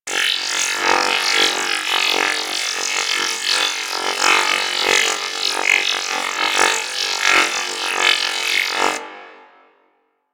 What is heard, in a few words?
Laser Loop; Warframe; Ambience; Corpus